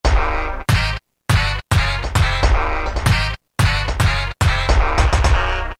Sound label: section hit; Roland M-DC1